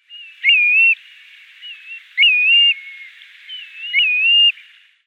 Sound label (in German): Kiebitzregenpfeifer Pluvialis squatarola; Vogelstimmen